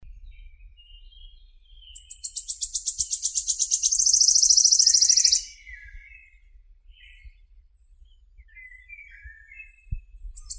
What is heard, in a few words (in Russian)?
penochka treshotka phylloscopus sibilatrix